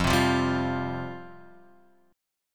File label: F Suspended 2nd, chord; Fsus2 Chord {1 3 3 0 X 1} strummed